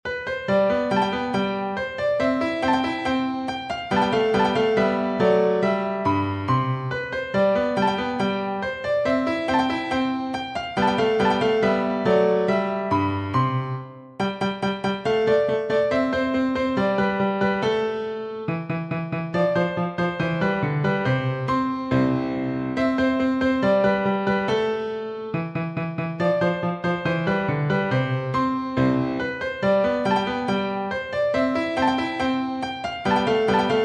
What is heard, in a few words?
Piano